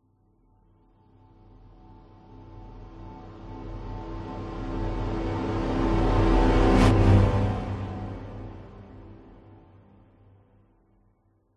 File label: sound design cinematic orchestral music riser dramatic horns strings; zapsplat